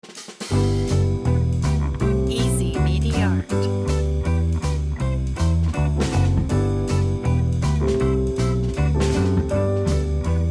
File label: light jazz background